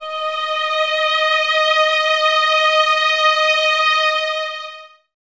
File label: VS D#5, Violins, String Ensembles; Miroslav Vitous; 11 Violins